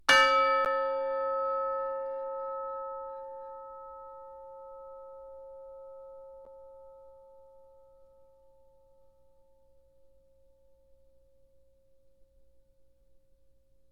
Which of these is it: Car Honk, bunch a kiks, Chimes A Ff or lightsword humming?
Chimes A Ff